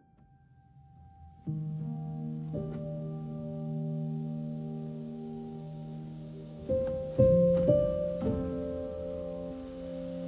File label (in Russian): zdorovyj son muzyka zvuki; stereo relax media group